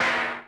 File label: SIMM METAL, SIMMONS; Hollywood Edge; The Big Bad Beatmachine